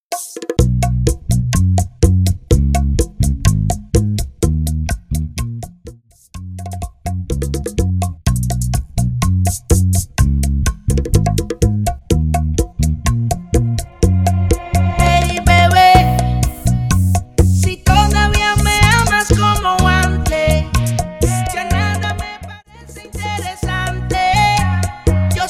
Trans Bachata To Trap 125BPM; transition bachata to trap

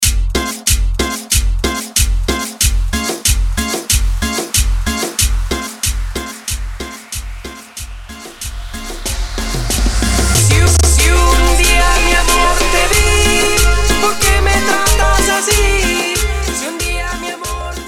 Intro Outro Break 93 BPM

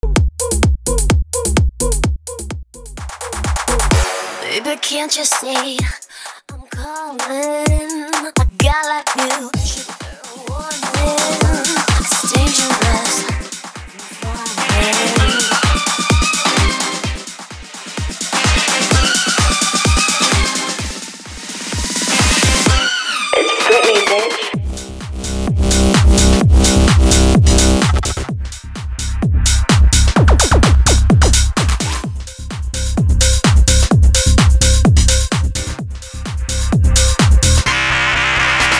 tech house 128bpm

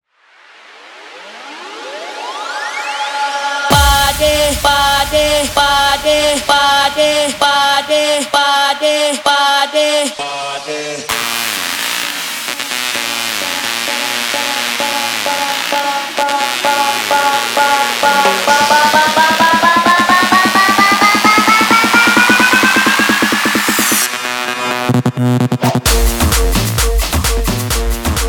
mashup intro outro 130 bpm; Open Show Mashup

